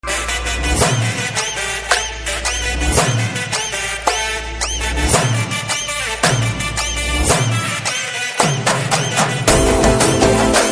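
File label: background music Theme; Eeswaran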